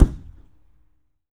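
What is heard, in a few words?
low boom; 3D Sound - 3D Keys VOL-1; MEAN 3D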